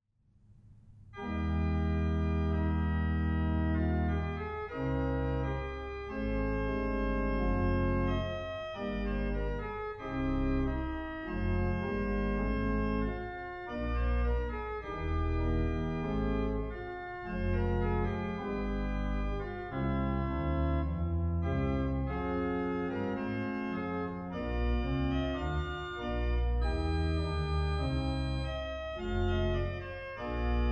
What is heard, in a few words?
Ch Cornet